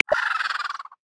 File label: African White-backed Vulture; Xiaomi Redmi-Note-8-Pro